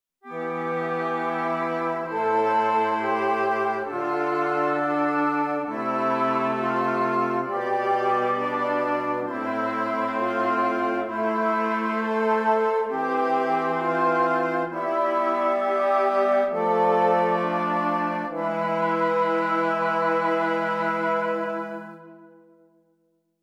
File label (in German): Stimmiger Contrapunkt; Ronge